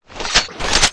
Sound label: beamgun charge shoot end